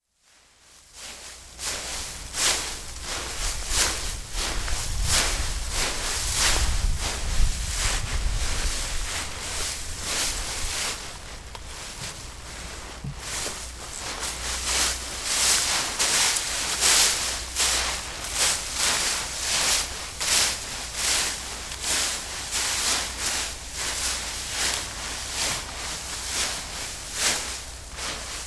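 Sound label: gathering fallen leaves; 落葉あつめ; 緑のちょうちょ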